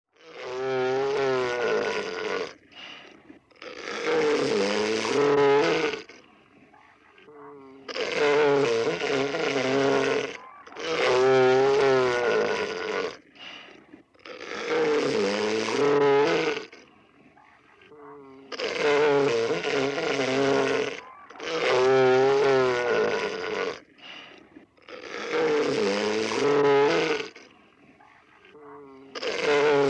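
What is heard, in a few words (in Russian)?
Морской слон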